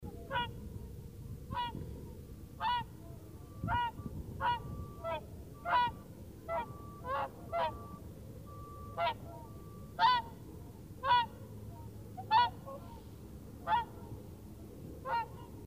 Mindre sångsvan

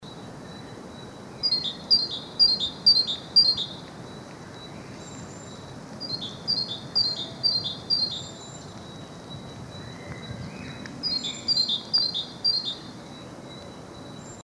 Talgoxe

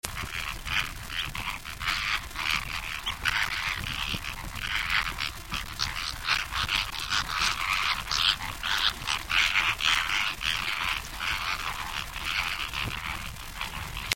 Flamingo; Greater Flamingo; Phoenicopterus ruber; Charles Hesse

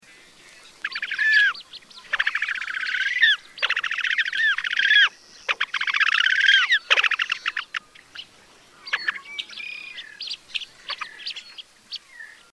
Svarthakedoping

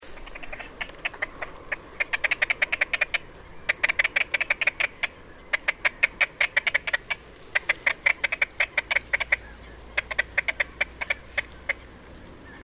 Skaggdopping

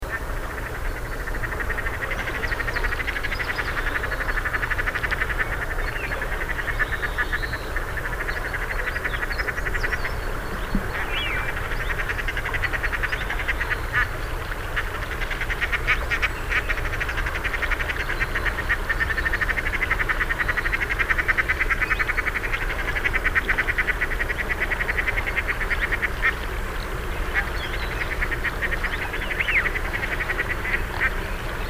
Gravand; Common Shelduck; Tadorna tadorna; Jarek Matusiak

